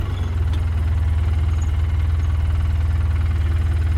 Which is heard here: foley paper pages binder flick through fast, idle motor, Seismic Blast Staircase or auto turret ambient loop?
idle motor